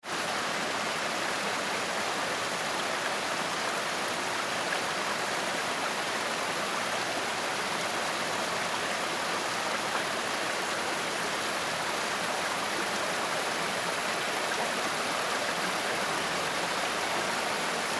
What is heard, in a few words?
water; Ukiha